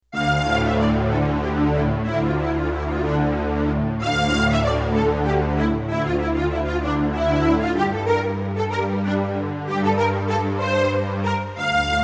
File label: Fugue